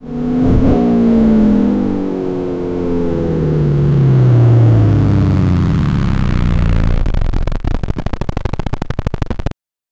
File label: simulating the sound of a, acceleration